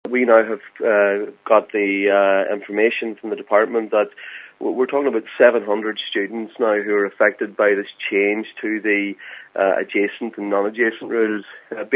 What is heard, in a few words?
pad